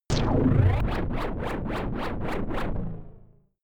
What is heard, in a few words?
shot wobble lurking